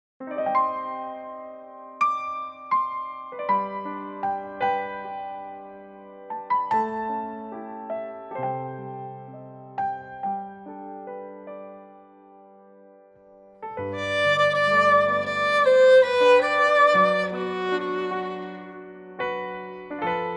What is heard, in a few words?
violin